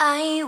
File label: vocal